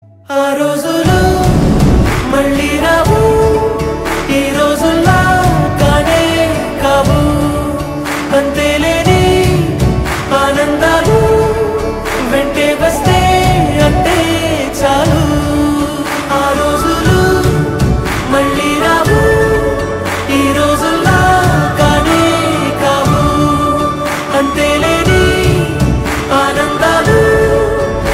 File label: ringtone